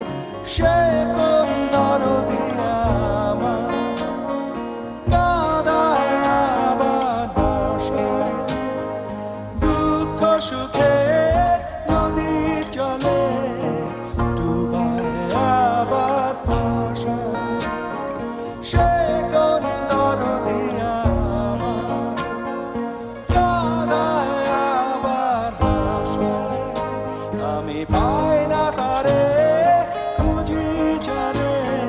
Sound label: TV Live Acoustic Version